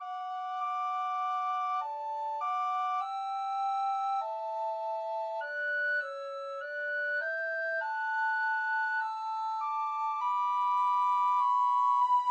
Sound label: recorder duet